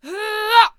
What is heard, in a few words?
Attack Vocals; Bomb Thrower